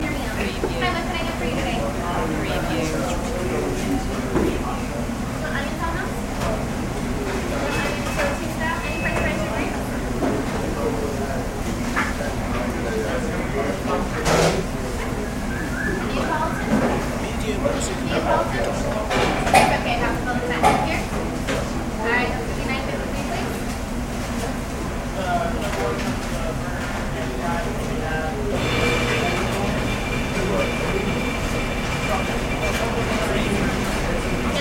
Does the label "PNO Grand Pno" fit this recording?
No